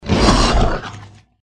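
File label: deathclaw power attack; npc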